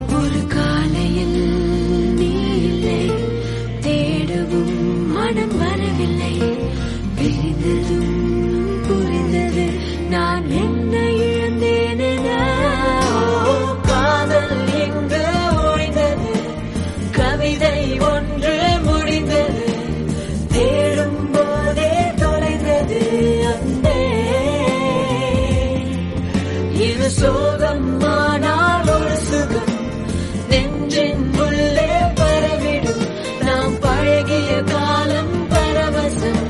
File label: Tamil Song